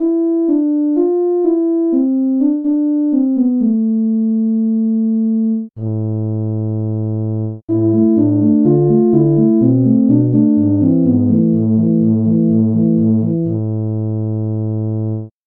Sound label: tuba